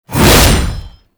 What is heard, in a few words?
Boss Strike Leap Whoosh; Enemies; Abilities; Warframe; Oraxia